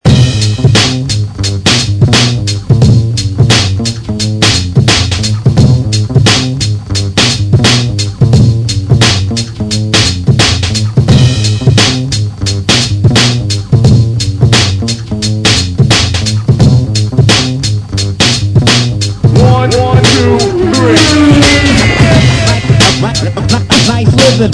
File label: The Bass